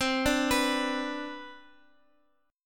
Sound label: C Major 7th Suspended 2nd, C, down, Guitar, Open F; arpeggiated; CM7sus2